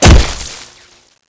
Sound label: coilhammer hit wall; Vaas